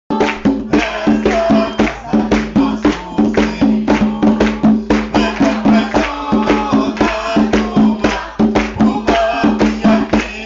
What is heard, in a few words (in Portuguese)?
Atabaque Defumacao